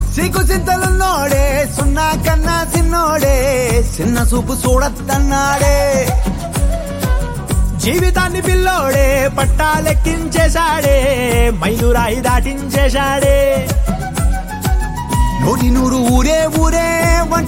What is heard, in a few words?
DJ BGM Ringtone; Middle Class Melodies